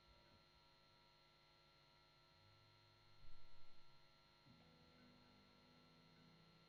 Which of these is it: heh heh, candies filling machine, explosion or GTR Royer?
GTR Royer